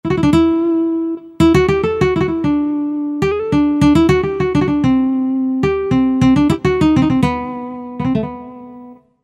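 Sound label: Acoustic Guitar Lyrical Solo; mfx chain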